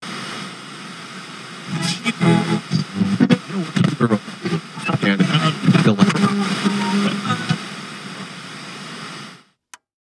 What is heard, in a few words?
Technology Electronic Machine Wood Record Player Flipping Through Stations Fast